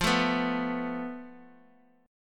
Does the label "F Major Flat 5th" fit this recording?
Yes